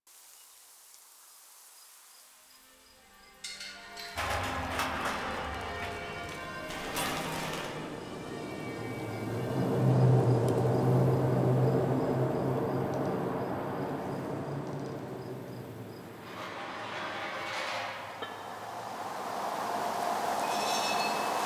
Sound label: Fall Exterior Large Bed Loop; Warframe; Nineteen Ninety Nine; Ambience